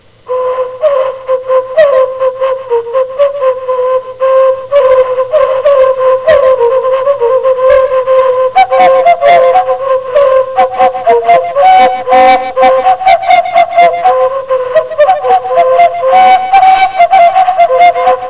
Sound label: bamboo flute round